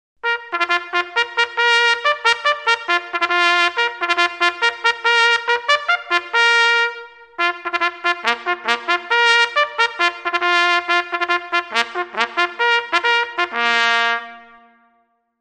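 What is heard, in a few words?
signals, bugle; na obed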